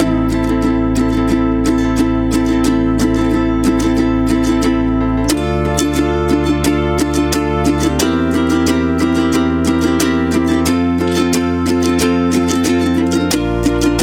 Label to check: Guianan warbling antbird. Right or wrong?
Wrong